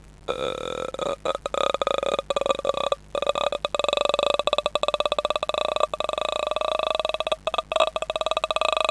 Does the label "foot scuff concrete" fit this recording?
No